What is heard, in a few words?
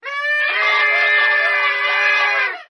Horns 4, Group / Party, ' New Year's Eve Celebration '.